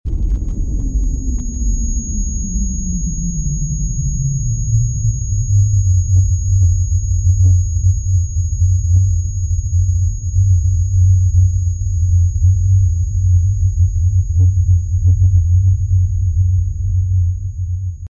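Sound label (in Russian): контузия после взрыва гранаты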